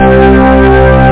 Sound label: church